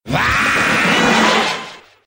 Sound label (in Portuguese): vinheta; ed banana